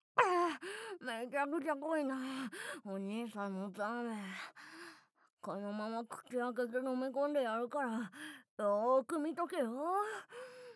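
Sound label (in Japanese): ザーメン ゲップ（単発）; ザーメンゲップ集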